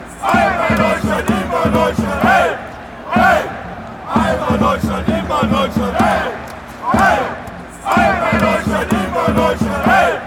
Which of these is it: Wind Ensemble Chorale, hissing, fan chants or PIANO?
fan chants